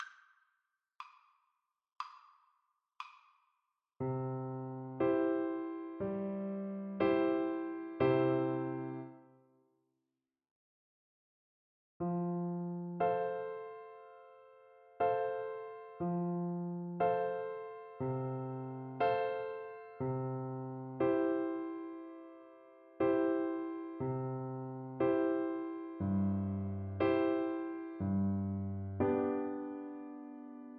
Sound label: Oboe